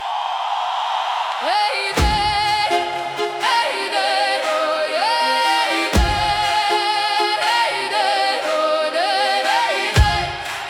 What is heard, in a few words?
Ukrainian Folk, choir; Ukraine